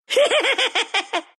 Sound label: laugh, ru; Dota 2